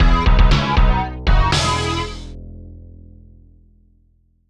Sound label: Lose Life; Micro Machines 2 Turbo Tournament SNES